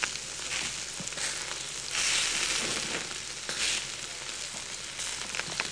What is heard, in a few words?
Gumbo Fire; Levels Hub Diner 05 Repetitive Spicy